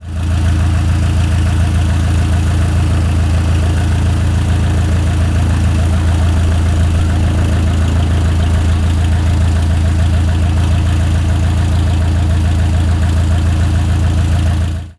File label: propeller plane idle; transportation